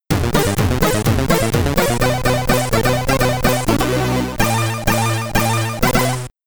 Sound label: Stage Clear; SID Stereo